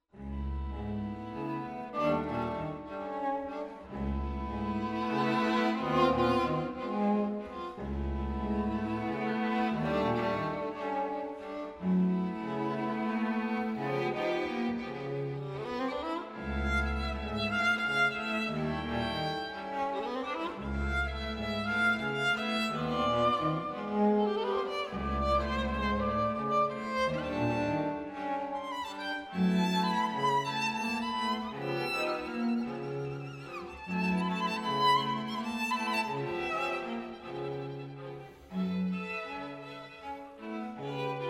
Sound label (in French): Quatuor a cordes; Choveaux